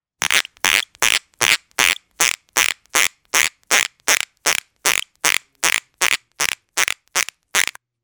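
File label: balloon sound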